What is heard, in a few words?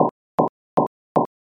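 footsteps soil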